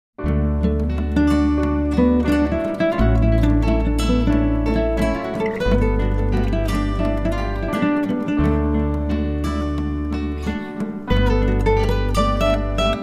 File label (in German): singspiel